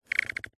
robotic crank servo or lever futuristic mobile app pick up sci fi mechanical glitch